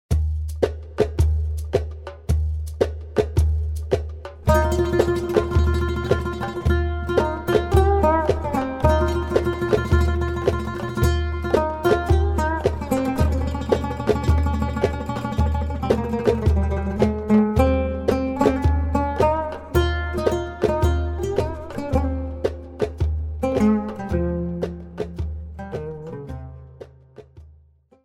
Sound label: Oriental, taksim oud